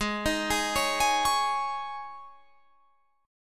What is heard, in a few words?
Gsharp Suspended 4th, Guitar, Drop D; 18,18,18,18,21,21